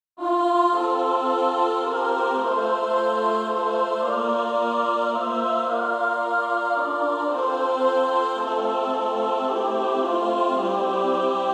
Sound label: Female Choir